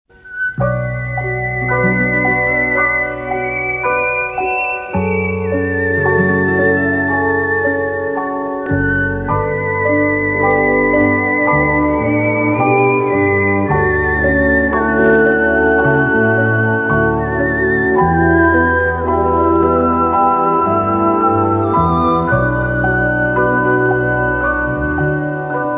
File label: Romantic